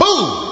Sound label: "boo" chap; ooh ahh